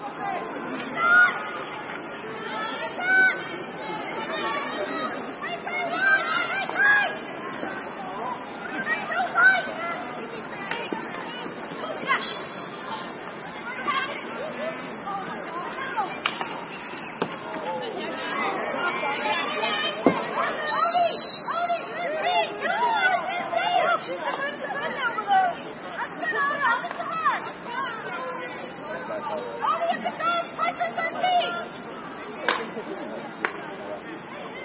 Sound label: Sport Woman Hockey